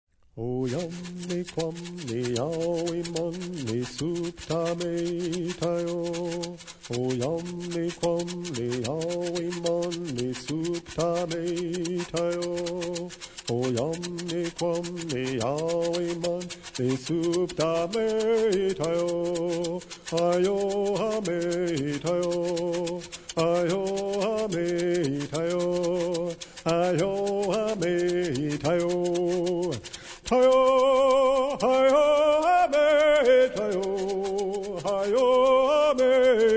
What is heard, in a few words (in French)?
Chant pour appeler les oiseaux